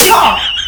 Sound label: drum hit whistle; osu